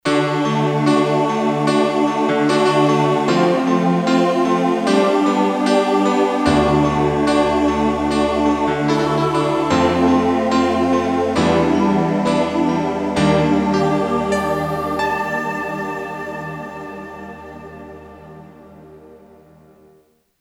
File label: Choir & Pn; KSDU-013 Combined Groups